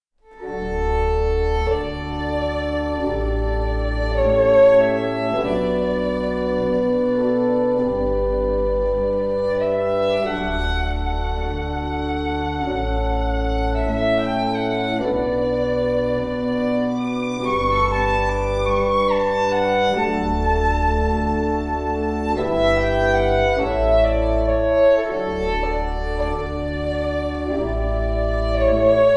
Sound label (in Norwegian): fiolin